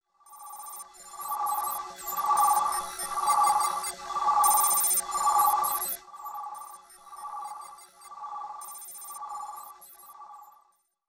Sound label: Rocket Beeps N Bloops; cinematics